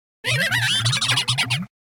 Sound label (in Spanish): Grito; Toxicroak